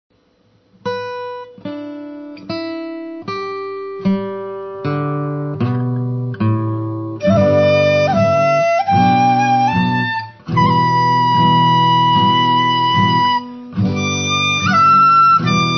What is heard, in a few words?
flute; lian2hua1; Ohio